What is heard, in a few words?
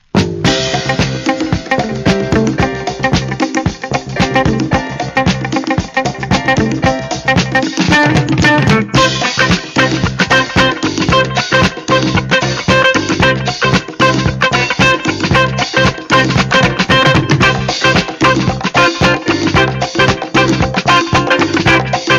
Brass Disco